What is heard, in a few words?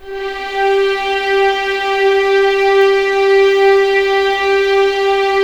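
G4 legato mf violins R; East West; The Ultimate String Collection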